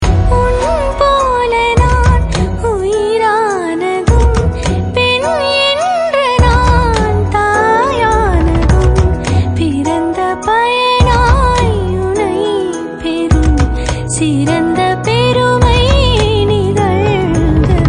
Female Ringtone

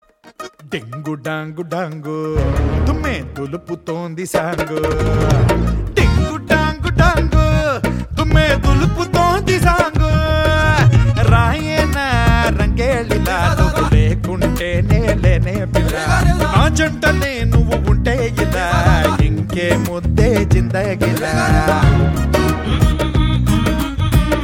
Ding Dong Ringtone

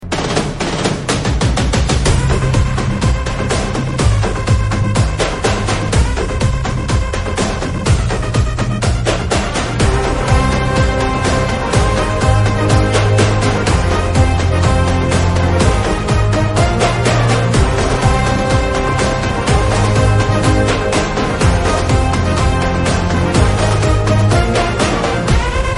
Karaoke Ringtone

